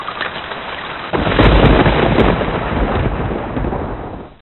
rain thunder

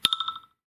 IPhone 12 Bamboo IOS 14

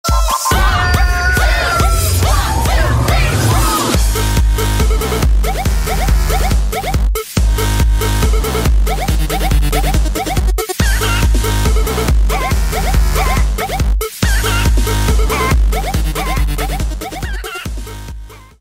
Chicken Song

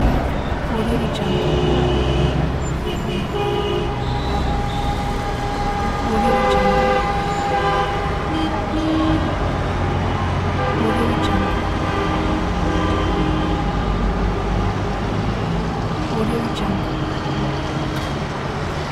Traffic jam with horn

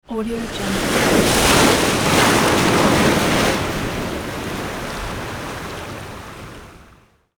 ocean wave crashes on the rock

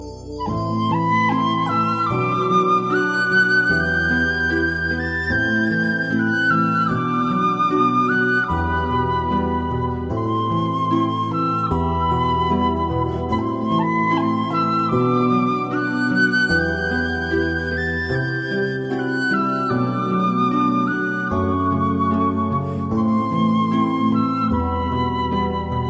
Melody Of Sadness

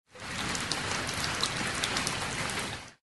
rainfall

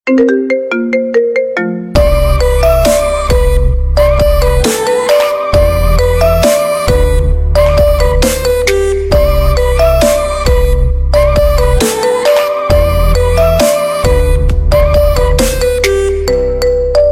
Iphone Marimba

